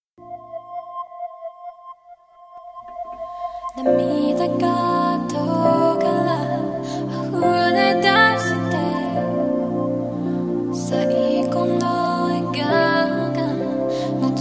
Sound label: funky; grievously abusing pitch correction to amusing effect